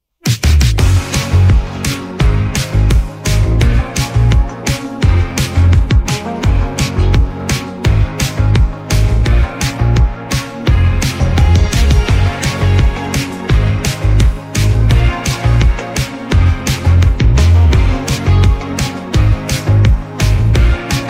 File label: Instrumental